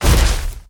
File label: step walk